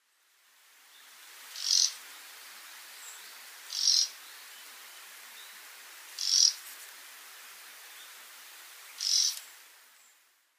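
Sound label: taiga flycatcher, sakata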